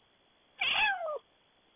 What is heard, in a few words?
cat meow